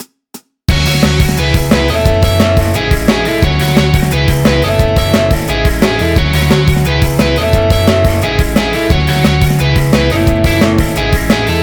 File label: seventh guitar